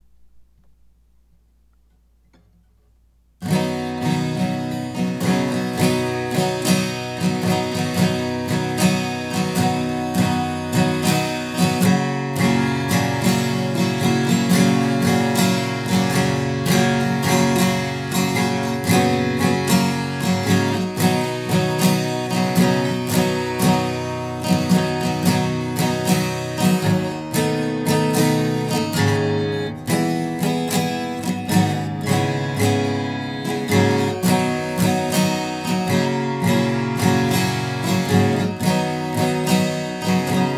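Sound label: strum 12 left, hsguitar; strm 12 left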